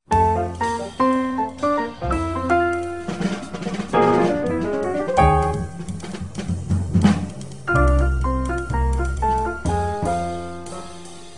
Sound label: Trio